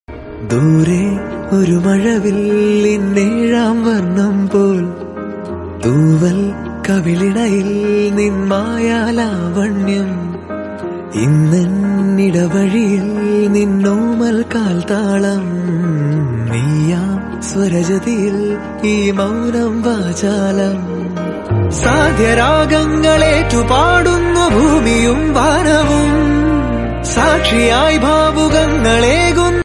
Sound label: happy birthday birthday song